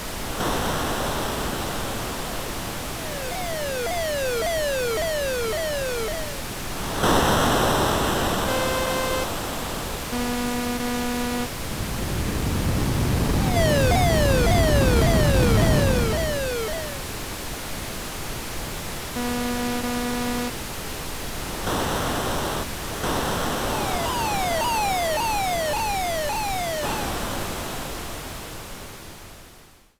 city Ambiance; Woah Dave; PS Vita

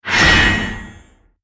Blessing Shield Start; Skill Blessing Shield Start

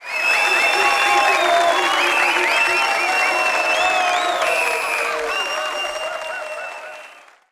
Crowd Wild

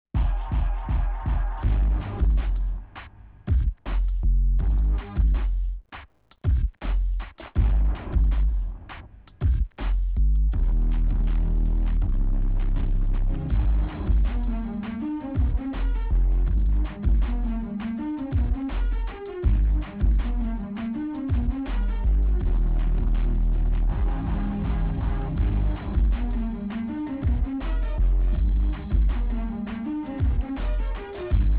Amb Caribbean Layer; Street Cricket Champions; PSP

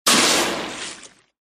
Assassin Skill

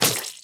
Fat Zombie Smash; gamerip

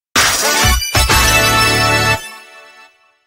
Victory!; Taisen Bomberman; android

